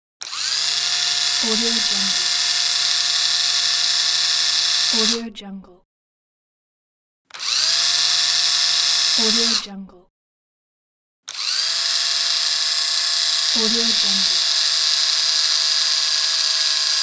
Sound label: electric drill; applehillstudios